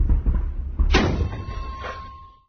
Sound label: cannon reload; Minecraft; Votive 1.21.1; Votive Content